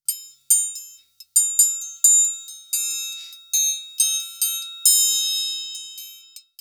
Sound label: Triangles 138, Percussion Elements, Abstract World Fusion